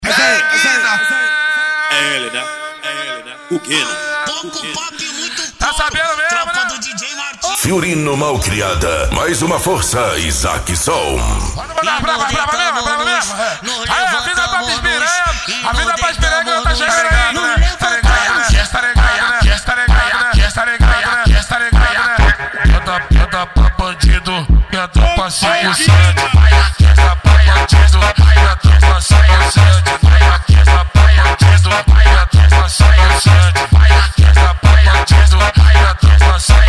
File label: mega funk bass